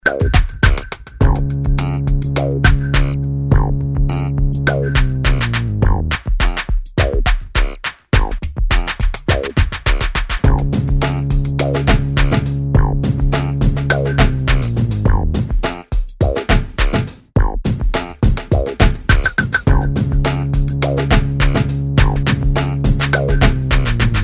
Song

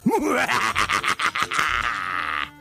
Laughing; Zorak

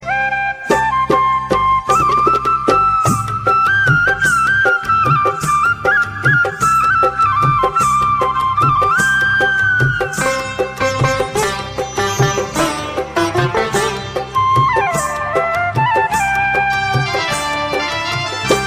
Flute Tabla Ghunghru

